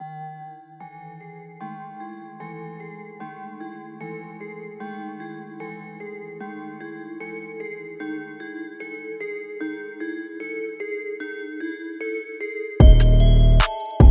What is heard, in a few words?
150Bpm, beats